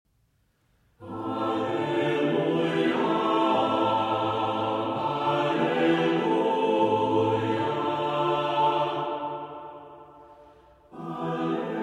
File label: Version For Vocal Ensemble; Alleluia-Tropus